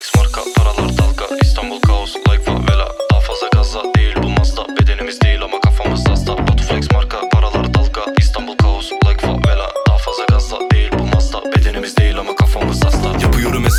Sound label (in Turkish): Dalga telefon zil sesi